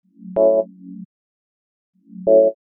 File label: 126 F# MUSIC; funky; Loopmasters; Funky Underground Vol 2